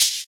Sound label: shaker sound sample D sharp key; percussion